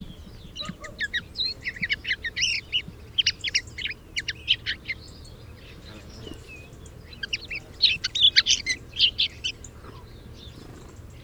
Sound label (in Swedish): Hampling; Carduelis cannabina; Linnet; Marco Dragonetti